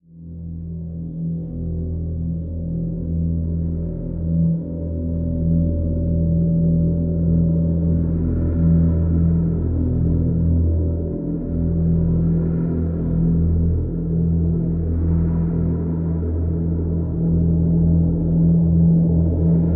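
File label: drone E; Drn Caverns Deep E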